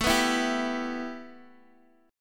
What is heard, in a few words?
A 7th Suspended 2nd, A, down, Guitar, Open D